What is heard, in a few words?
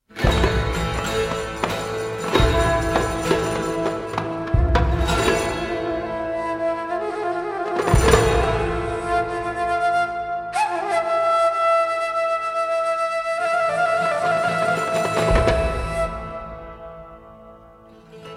Middle Eastern Flute Dance